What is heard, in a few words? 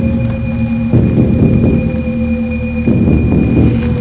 atmospheric; running-man